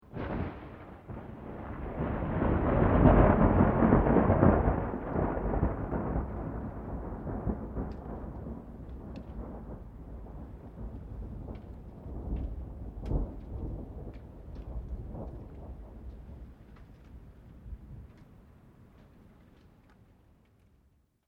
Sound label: THUNDER MID DISTANCE LONG; Elemental weather effects